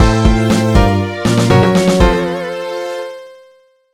Jingle Game Over

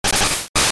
Vine Whip; sfx; attack moves; pokemon; gen 2; gsc

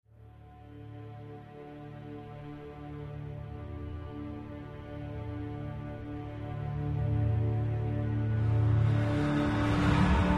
Linear Tense; the walking dead season one

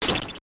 Bone hit; Gradius; MSX

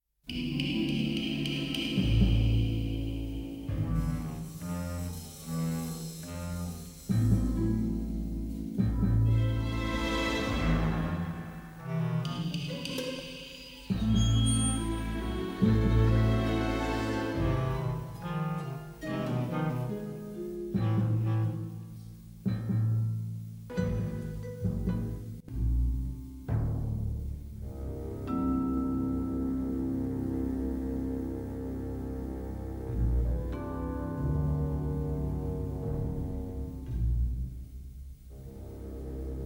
music, sewers calm

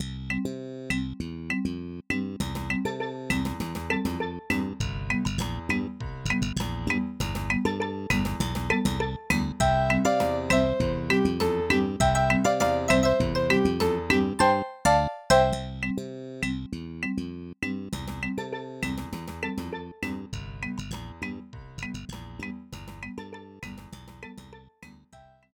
Roland SC-55; SimCity 2000

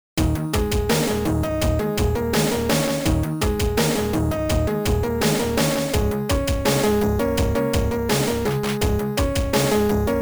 techno tune; world 02

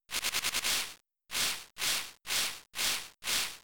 Poison Gas; Pokemon; Gen 3; attack moves; RSE

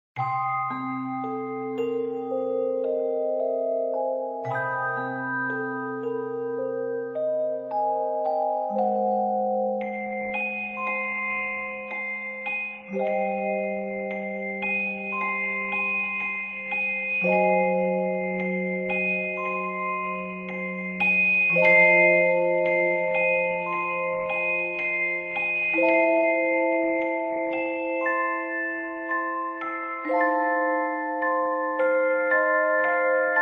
Mystery Ambiance; Alice: Madness Returns unreleased original soundtrack; Celeste

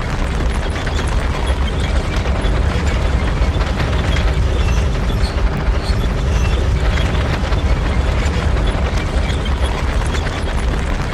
tank track; super trench attack 2

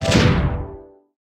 Heart Boom; Smashy Road Arena android ios 2016

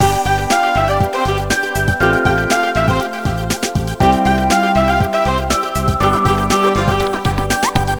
Music Calm; Learn to Fly 3

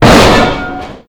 IMPACT Metal Scrap Deep Pipe stereo

